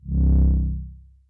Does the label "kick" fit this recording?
No